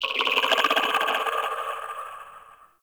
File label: Infested Tendril Tree Creaks; Ambience; Props; Warframe; Infested Eris